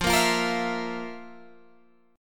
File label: F Major 7th Suspended 4th, Guitar, Open G